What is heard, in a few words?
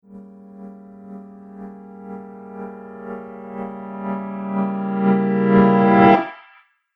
Horror Piano Tremble, Piano Sound Effects